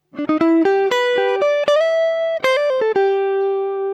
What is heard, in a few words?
Chorus, jazz